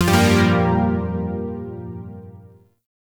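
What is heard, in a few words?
Shawzin Minor Chord; Chords; Warframe; Zariman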